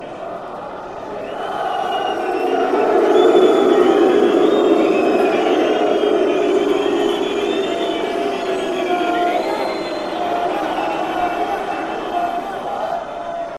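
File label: boo, football songs and soccer chants; hufc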